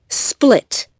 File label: SPLIT